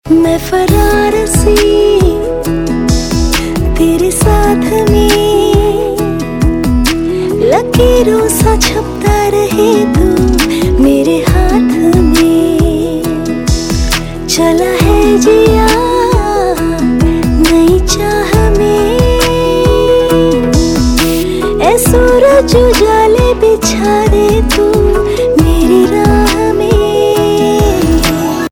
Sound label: Female Ringtone; Bollywood